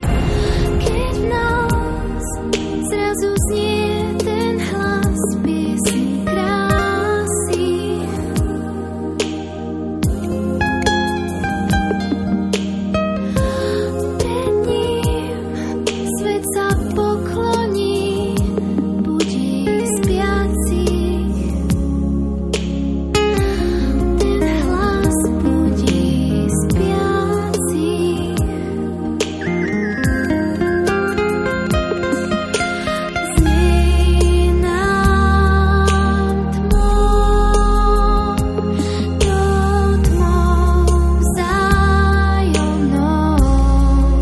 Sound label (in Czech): hlas